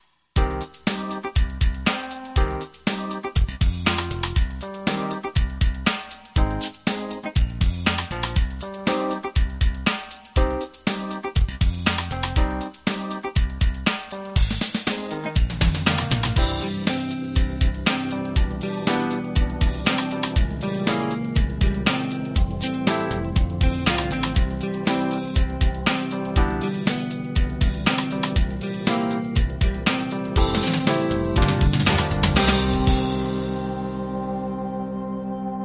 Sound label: Fusion